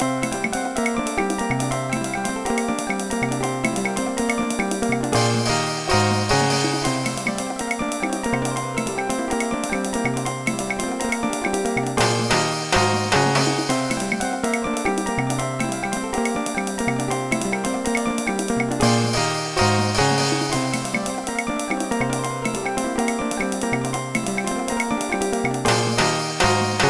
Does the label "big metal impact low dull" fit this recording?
No